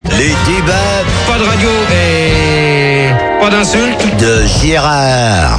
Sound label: jingle